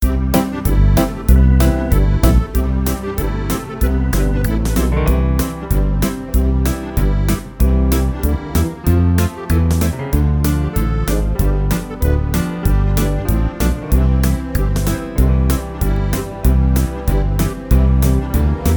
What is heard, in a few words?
JINGLE BELLS FAST 96